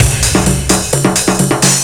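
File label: drum loop 130, left; House; Best Service ProSamples vol.10 - House; AKAI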